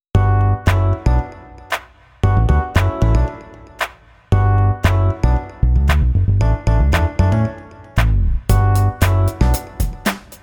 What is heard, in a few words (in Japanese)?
jikosyoukai2, bgm